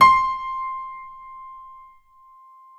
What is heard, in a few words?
PNO FF; Synclavier Sampler Library